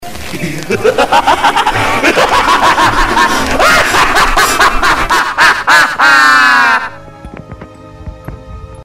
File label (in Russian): zvuk smeha cartoon cat